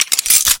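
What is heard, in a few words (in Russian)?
перезарядка межгалактического оружия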